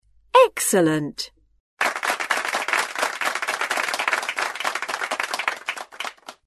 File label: stream sound